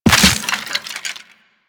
Land Soft Bone, Footsteps; Warframe; Operator

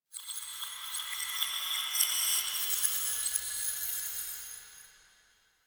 Metal Whispers; Ambience; Warframe; The New War; Quests; Zariman

